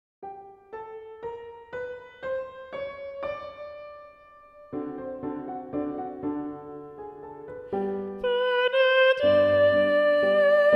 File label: Benedictus, Balanced; Mass No2 in G